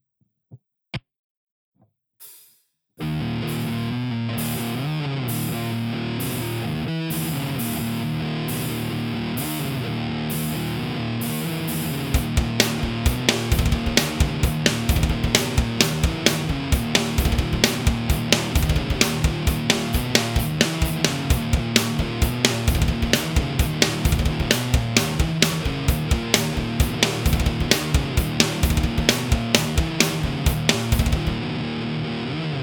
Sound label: Riff